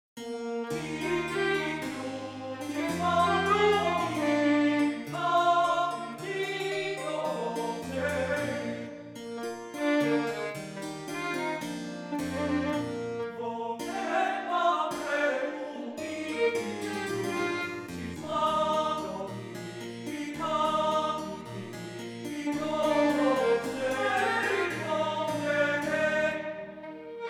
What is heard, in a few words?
Lied per Voce Violino e Cembalo